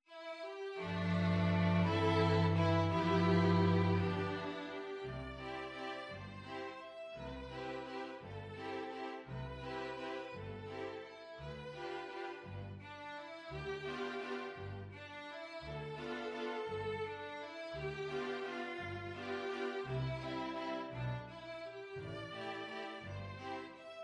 string ensemble; je te veux

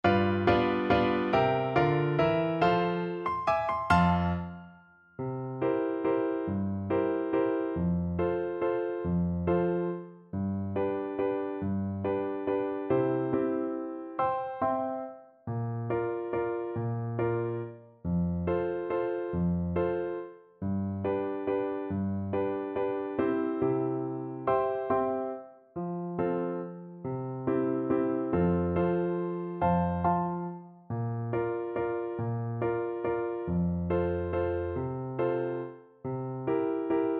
Clarinet

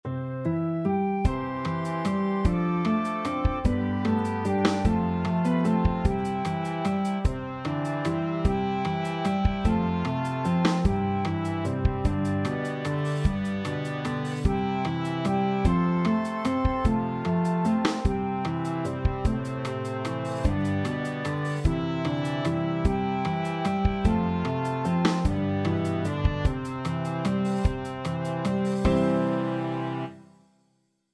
flexible 4 players and rhythm section

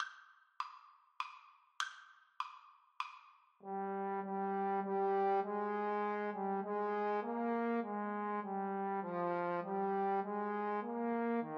Trombone Duet

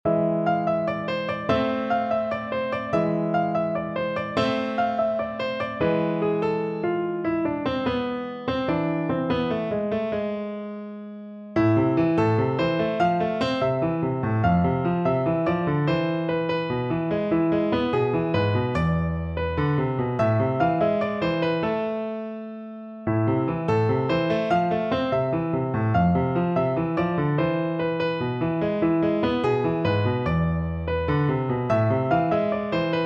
PNO

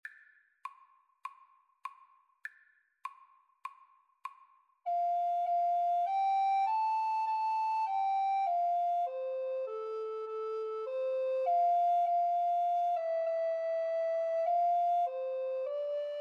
alto recorder, Alto Recorder Duet

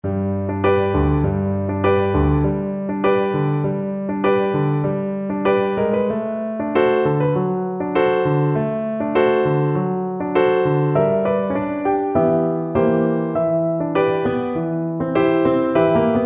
tango, Cello; albeniz

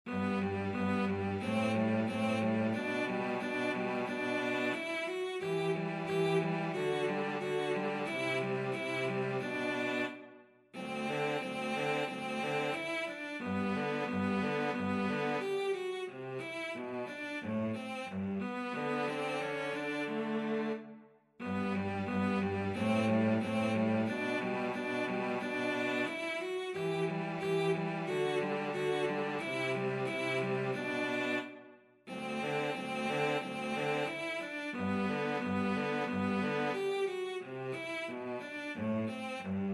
cello duet

